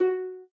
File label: harp, note; Eaglercraft b1.3